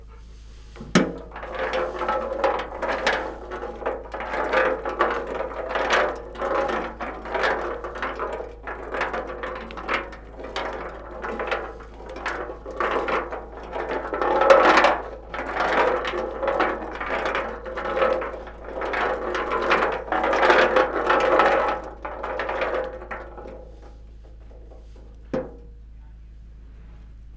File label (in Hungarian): talicskaban zorgo dio; varboc